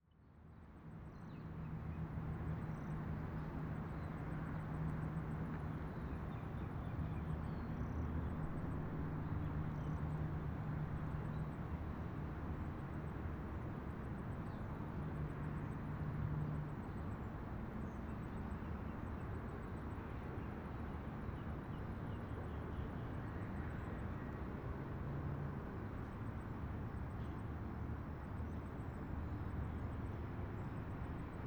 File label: New Zealand Auckland City; North Island Ambience; The Sound Keeper